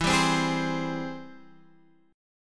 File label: Ab Augmented Major 7th E, guitar